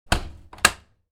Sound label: Washing machine washer close door; cleaning